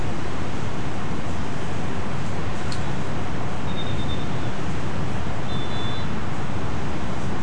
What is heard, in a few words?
unidentified rapid piercing calls c3.5kHz shorebird; nocturnal flight call project in beijing autumn 2021